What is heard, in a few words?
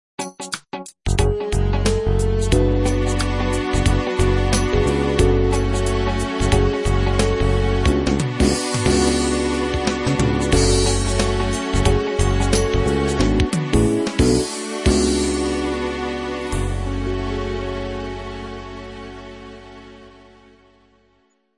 hiphop